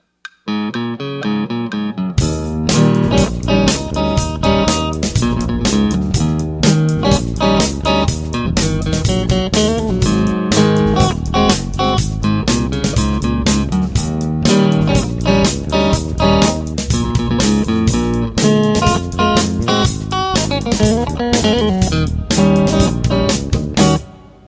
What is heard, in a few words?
Strat